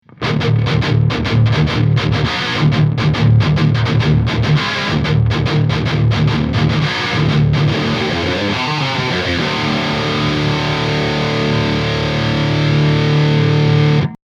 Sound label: jcm800 tubescreamer, gtar